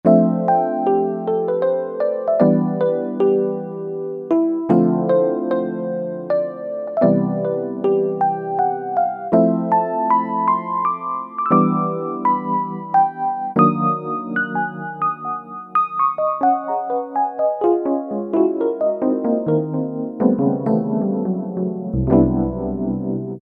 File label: key Easy Tine, E-mu PK-6